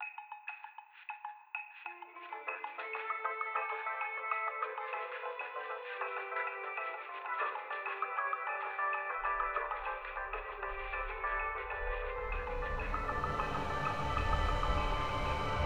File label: Elevator Music; Level2